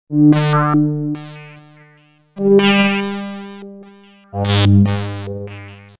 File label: sh lpf